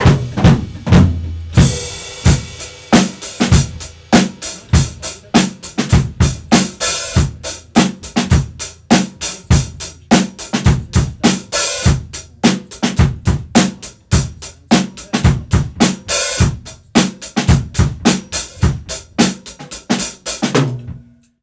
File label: drums